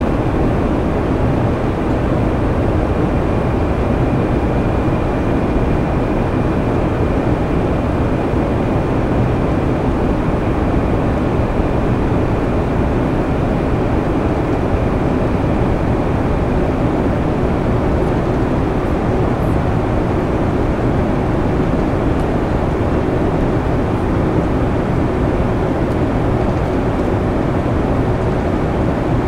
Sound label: amb airplane; ambient